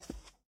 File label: Foot Carpet Shoes Walk; Lucius III; pc linux